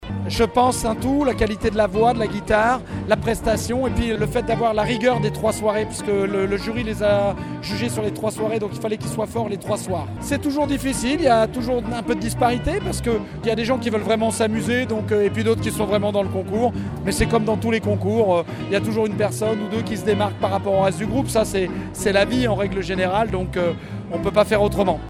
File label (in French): fetival guitare